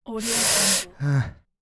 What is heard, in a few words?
human aghh